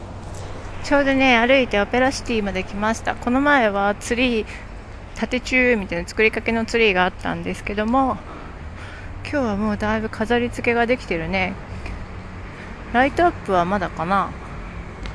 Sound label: walking